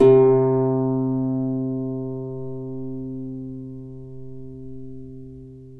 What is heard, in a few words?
Celtic Harp, Plucked Strings